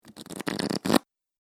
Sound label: Cards Fast Pack Flick; Card Sound Effects; casino sound effects